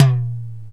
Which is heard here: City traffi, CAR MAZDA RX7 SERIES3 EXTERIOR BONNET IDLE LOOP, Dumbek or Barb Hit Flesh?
Dumbek